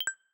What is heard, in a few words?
vox disable; TeamTalk v5.17 ubuntu22 client; Majorly-G